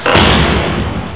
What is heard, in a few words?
door